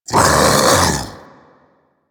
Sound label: Infested Splitter Vocal Attack Medium; Warframe; Grey Strain